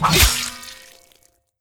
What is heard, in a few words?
Attack